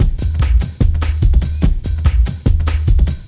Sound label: JUNGLE SLO; Distored Reality